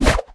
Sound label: Web